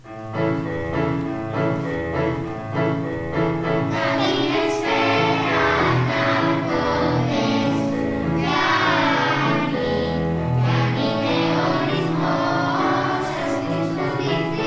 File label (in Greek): Κάλαντα Χριστουγέννων; Δ τάξη